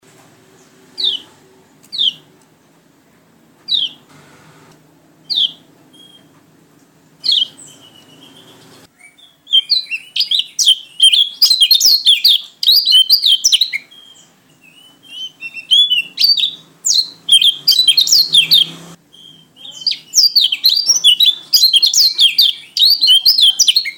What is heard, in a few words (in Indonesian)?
Suara Burung Pleci Gacor Ngerol Panjang